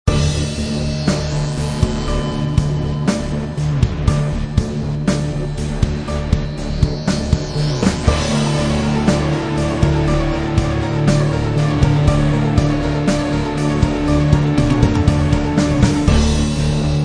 Music Boss Track

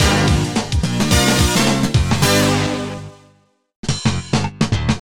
Action Level Up; big money deluxe windows gamerip 2002

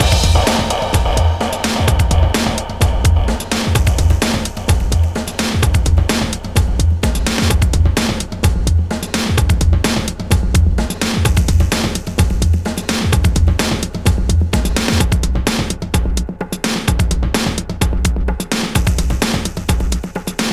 Victory; Unreal Championship; xbox

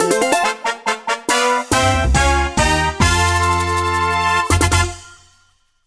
All Character Peices Goal; Mario Party Star Rush

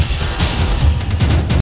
music shift; Unreal II The Awakening; DrakkBoss

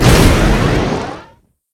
Drift Boost; multiplayer kart racing; boom karts; android

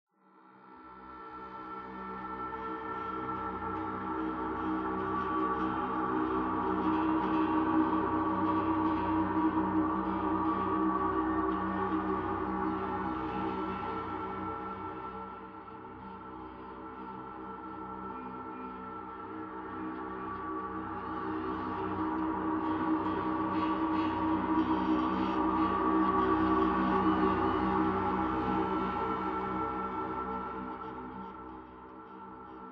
Pad Second Interior two; The Sinking City

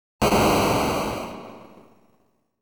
Exploding Box; TRAP; Wizardry II Legacy of Llylgamyn

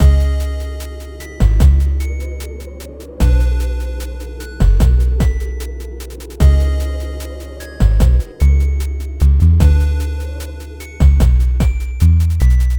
Mountain Cave Intro; ravva and the phantom library

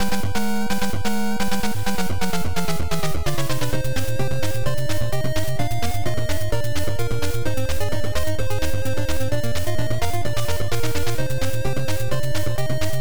Battle; Chaos World; Family Computer

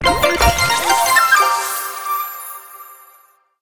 tada; Boulder Attack 2; Buddy

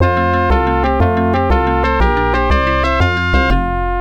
Battle ~ Win; pepen ga pengo genesis

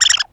Bird

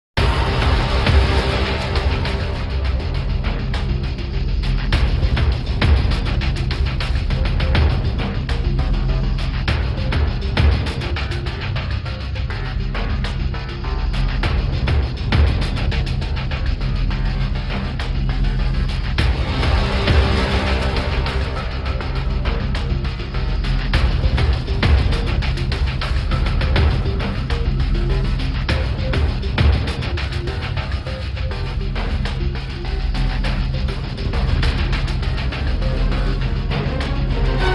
Battle

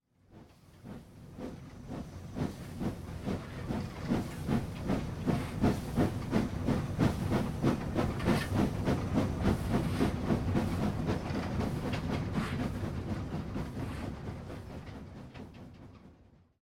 Train Depart; The 39 Steps

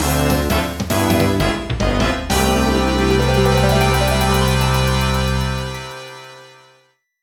Jingle Guide Complete!

